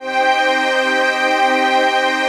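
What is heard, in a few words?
chord pad, Pads; Best Service; XX Large - Pads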